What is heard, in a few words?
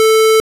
distortion